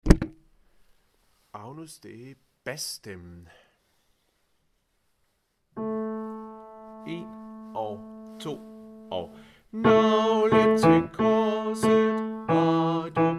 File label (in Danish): bas; Agnus dei; harlev koret